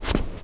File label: slide